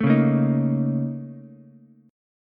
A sharp Minor Major 11th, A sharp, down, Ukulele, C6; strummed